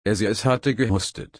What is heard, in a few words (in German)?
husten